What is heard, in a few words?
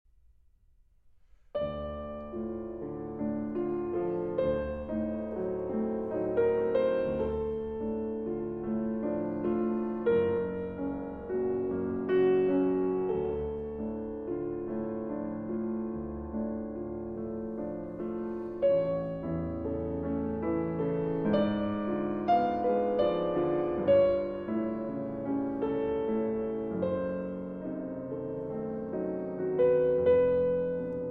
Piano Trio in G Minor, Assez Lent